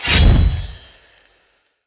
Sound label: activate Walking Wild; netent; narcos